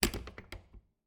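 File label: Refrigerator, old fashioned, wooden, steel, brass clasps, hinges, open, jostle, clatter; Eiravaein Works; Vaeyan IV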